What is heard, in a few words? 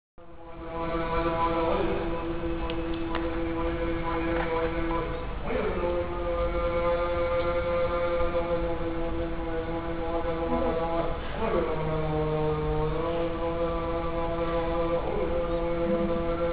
Buddhist Praying; Japan